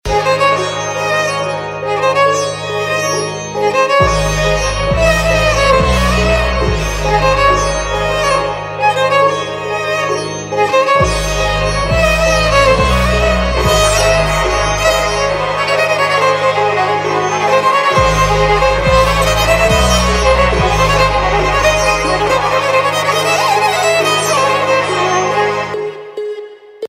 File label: Theme Ringtone, Punjabi